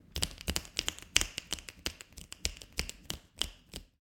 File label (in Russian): zvuk snimkov na fotoapparat